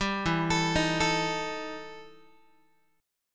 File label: E Minor Major 7th Double Flat 5th E Guitar; arpeggiated; JGuitar